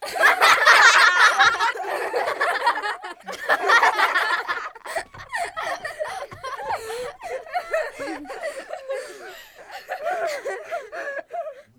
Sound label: Long Children Laughing